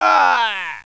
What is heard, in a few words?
die; Caesar III